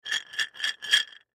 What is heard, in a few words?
Jar Shake Pretzel, Jar Shake Pretzels Rattle Glass; Food; Foley; Household; Blastwave